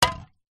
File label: furniture polish can set down wood surface; household